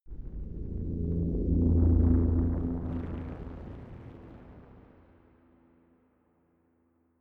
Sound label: bubbling rumble chord, drones